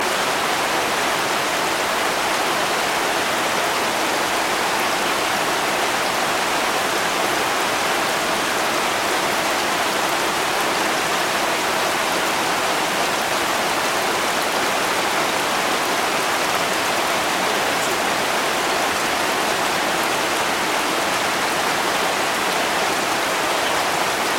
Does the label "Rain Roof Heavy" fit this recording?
Yes